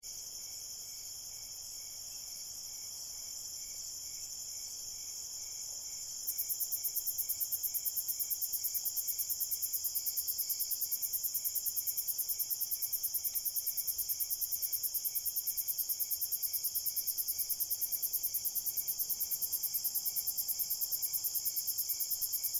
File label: Crickets Night Cornfield Shaker; Insects